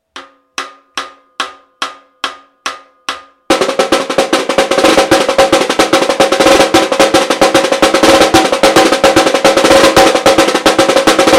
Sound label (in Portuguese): batucada caixas caixa 144 bpm